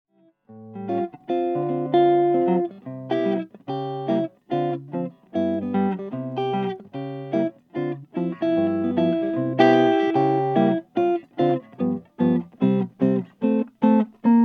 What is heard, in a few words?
zimnicki 16 archtop birdseye maple